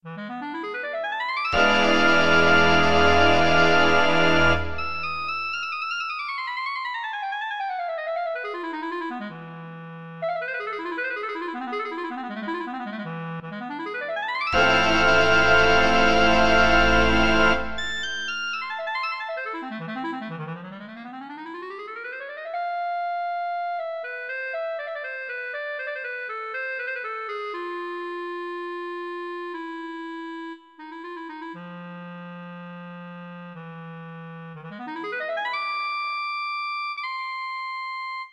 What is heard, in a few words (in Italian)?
clarinetto e banda